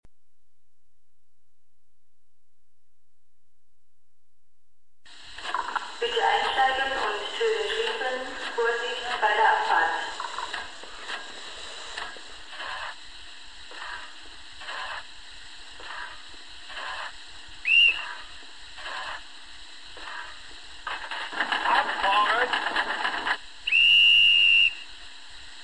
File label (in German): Soundbeispiel Dampflok BR-64; Piko; Piko Gartenbahn G